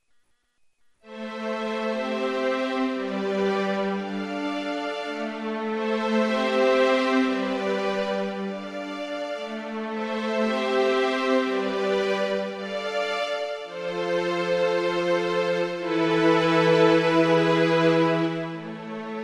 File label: string